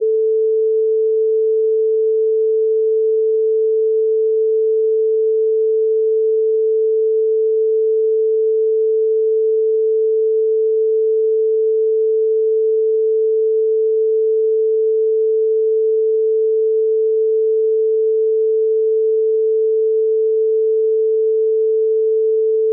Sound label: 440Hz